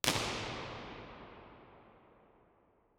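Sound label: omni, impulse response, nuclear reactor hall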